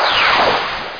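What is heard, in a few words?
lightning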